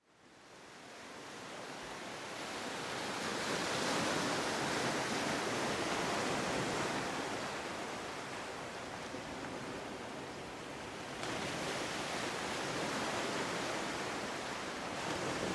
Surround ocean surf Stereo Downmix, Steady, even surround recording of ocean waves; Ocean Surf - Steady, even surround recording of ocean waves washing up on the sea shore, recorded from 30ft / 10m dist